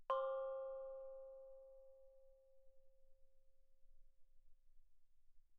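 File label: thai gong C5 mezzo forte, Percussion gongs & tamtams; thaigongs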